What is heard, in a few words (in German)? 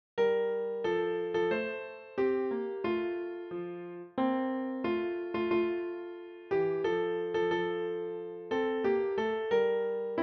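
O Tannenbaum Klavier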